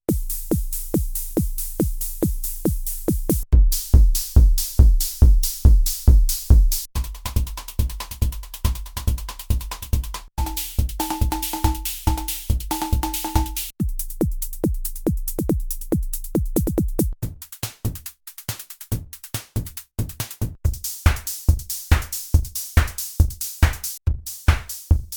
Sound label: Rhythm